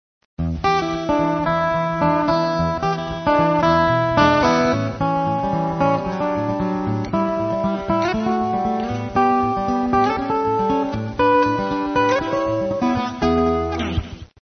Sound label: acoustic blues, guitars